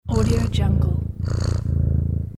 purring

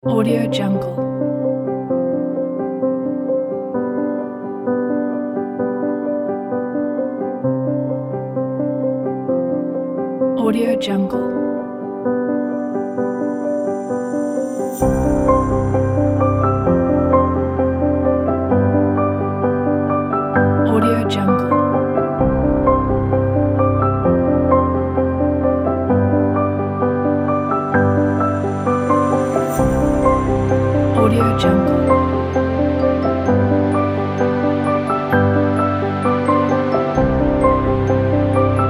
classical

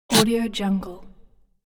short pain critter monster voice

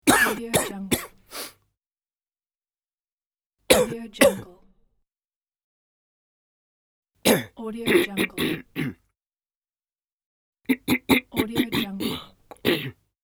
coughing pack

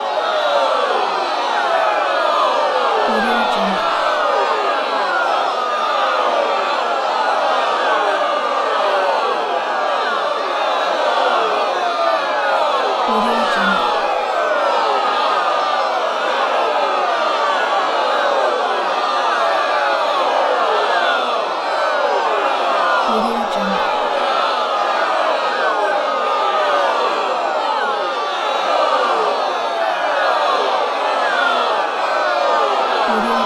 crowd protest

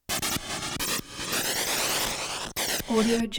tubular synth blasts short buzzes suction

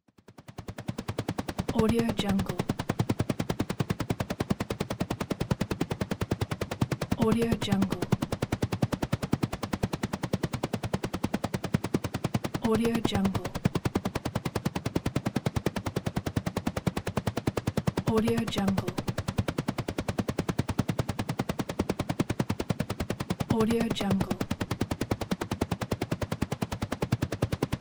propeller

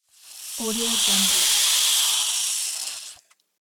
spider sound

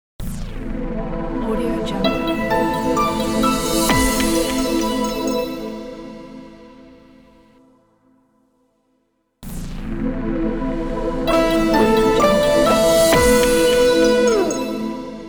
inspiring logo

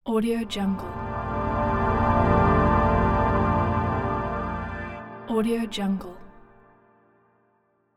ambient pad swell

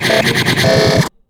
futuristic sound effect

